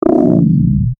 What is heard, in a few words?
spawn sound effect, appearance